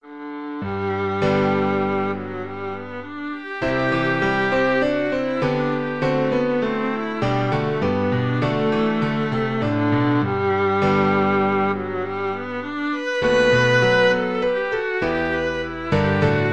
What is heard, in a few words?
reverie, viola; schumann